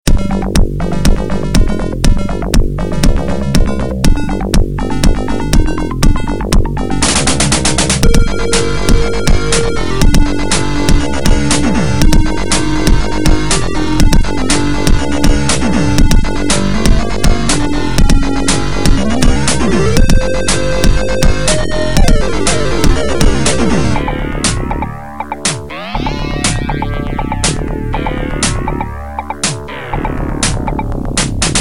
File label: turbulence; dune; sega